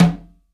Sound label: CONGA; STEREO CONGA; Cuba